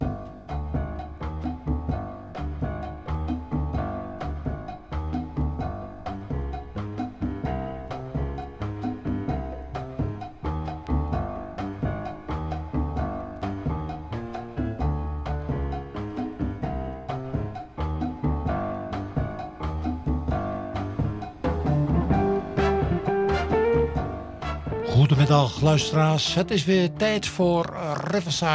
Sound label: Jazz